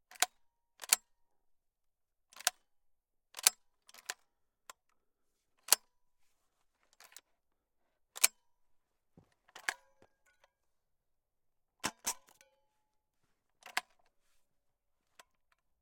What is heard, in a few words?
Beretta 1201F Failed Racking Movements; MKH418S; MS Decoded; Pole Position